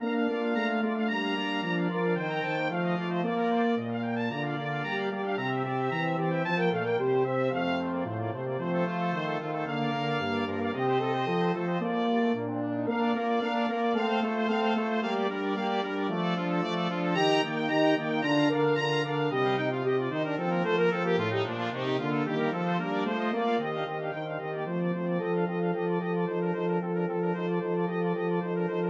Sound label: brass 5tet